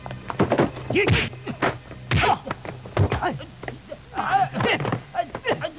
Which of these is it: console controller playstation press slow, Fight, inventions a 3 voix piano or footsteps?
Fight